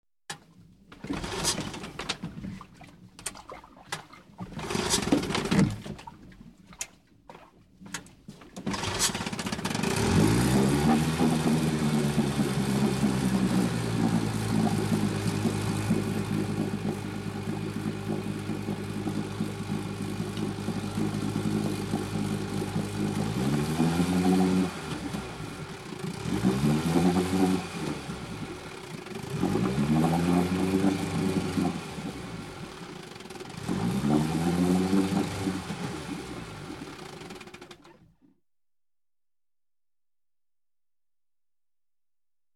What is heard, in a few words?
Boat engine startup